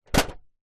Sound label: office colouring pencils in tin place down; zapsplat